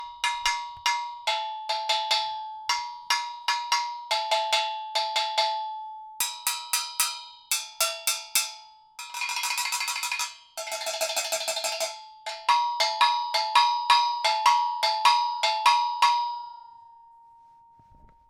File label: double satellite bells; pete engelhart